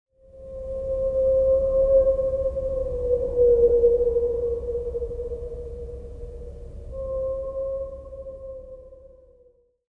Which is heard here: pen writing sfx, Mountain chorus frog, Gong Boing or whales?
whales